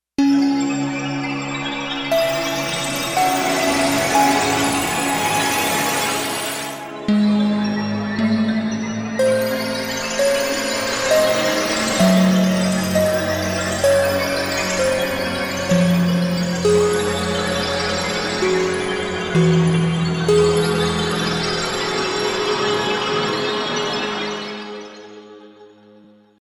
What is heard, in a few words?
Wet Room